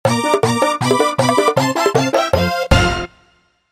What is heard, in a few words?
Bonus Game Clear; Super Mario World restored